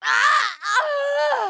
impaled scream; mk9; mileena

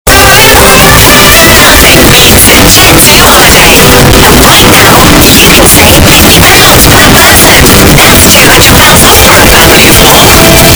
way too bass boosted; jet2 holiday

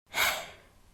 sigh

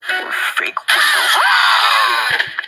or fake windows aaaaaaaaaaaaah

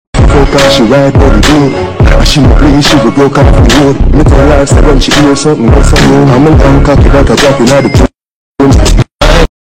bass boosted

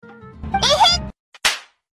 eh heh slaps

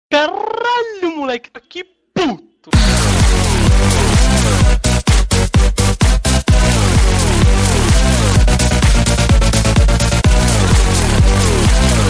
ringtone; league of lekao

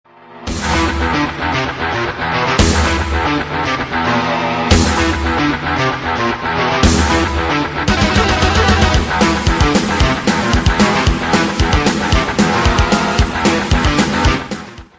doom stage 1 music

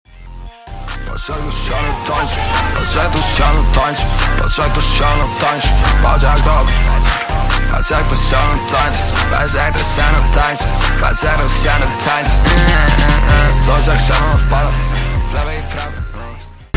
sub alert

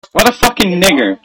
munch and crunch; pewdiepie; pubg

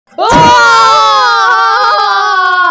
screaming

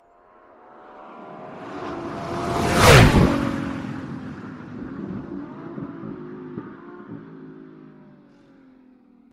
laferrari

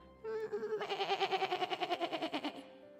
sheep

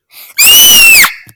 iiiiiiiiiiiiiiiiiiiiiiiiiiiiiiiiiii

